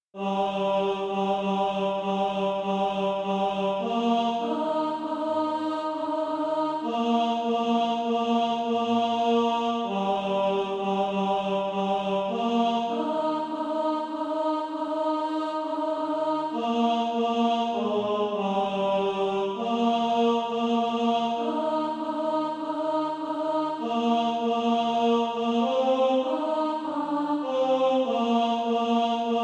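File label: tenor, SATB Practise Music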